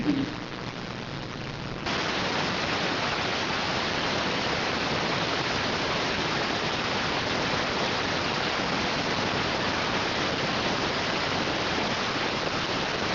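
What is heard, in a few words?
radioteletype receive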